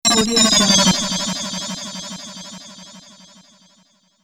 objective wrong; interface sounds

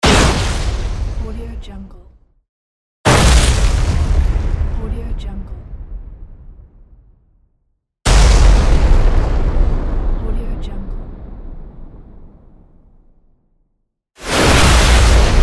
explosions

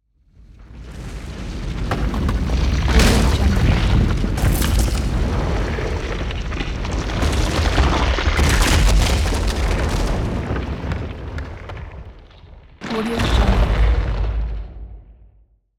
earthquake avalanche; ریزش زمین لرزه

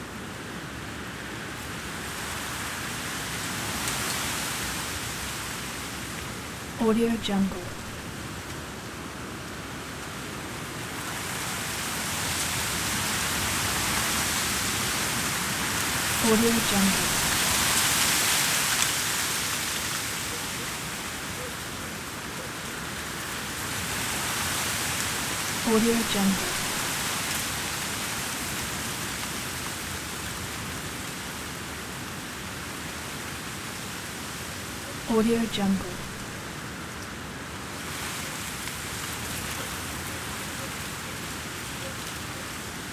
wind in the trees

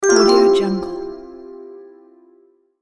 notification harp chime; interface sounds

